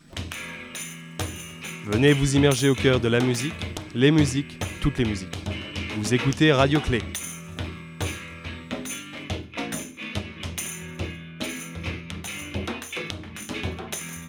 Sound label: jingle music